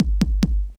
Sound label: kick